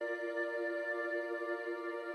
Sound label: Reel Spin Loop; sugar Parade Desktop; Mahi Gaming